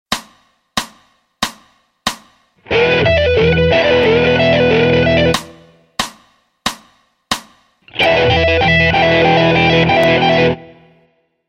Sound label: lick example